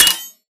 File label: bullet light ballistic blades deflection; Titanfall 2